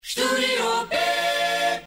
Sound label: Opener Acapella; DRS 3; studiob